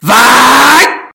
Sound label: aaaaiiiii